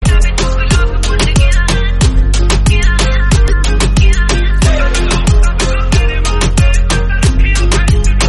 Glock

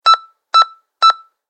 answering machine beep

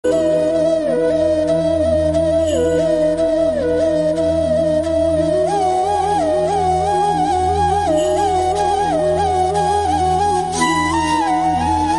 Marathi Devotional Instrumental

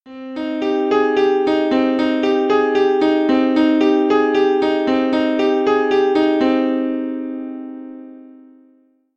ringtone

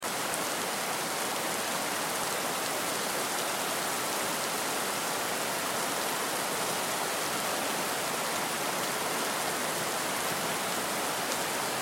heavy rainfall

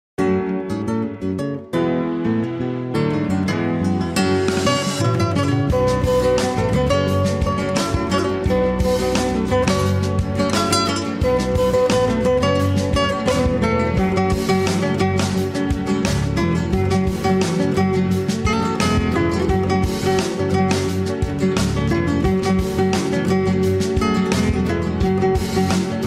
ringtone

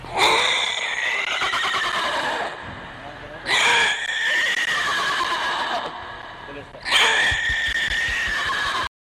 horse sound

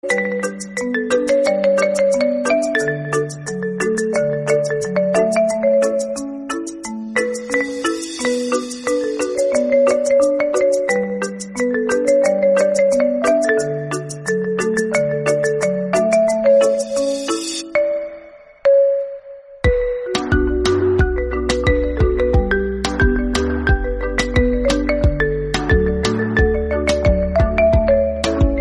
instrumental

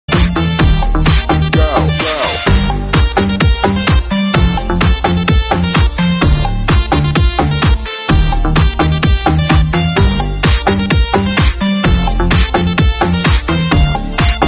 remix

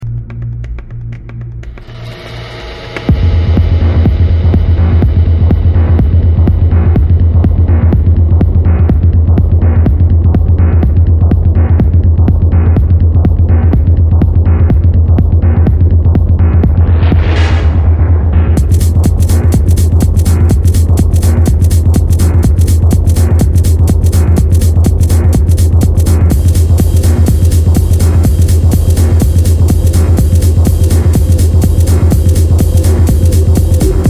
arrythmic mix

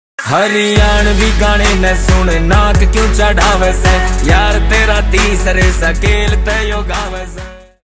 Tisra Scale

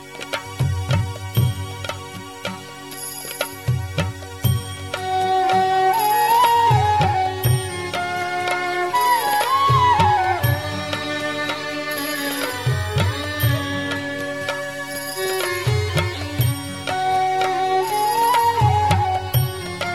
navratri instrumental

